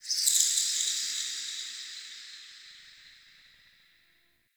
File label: High Creature Echo; Ambience; Dungeons; Under City